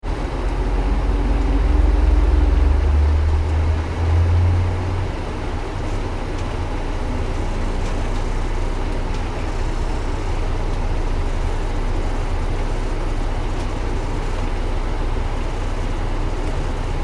transportation bus driving nyc internal, Bus Driving In City (Internal); Bus Driving In City ( Nyc ) - Internal Recording; Busses; Vehicles; McKinney Sound